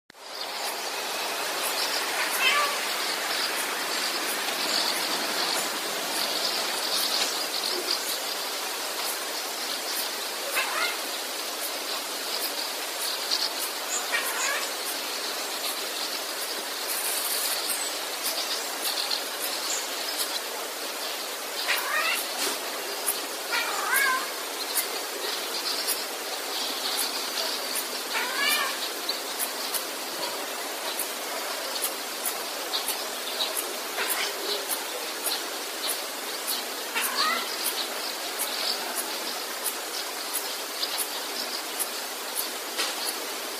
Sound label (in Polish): sojka; sójka trochę niedbale naśladuje kota